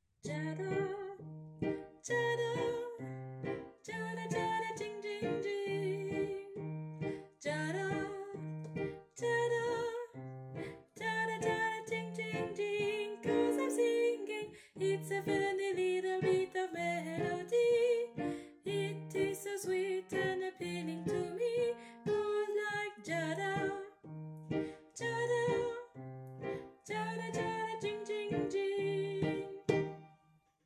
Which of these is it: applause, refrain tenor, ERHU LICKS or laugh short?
refrain tenor